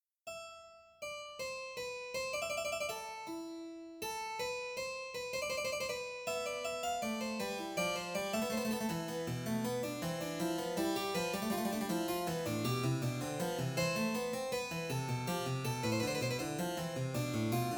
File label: Three Fugatos for Harpsichord